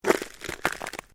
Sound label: Ice Crackling Short Stereo, Ice movement, cracking and shifting; Snow; Weather